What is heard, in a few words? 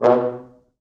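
4 French Horns, Horns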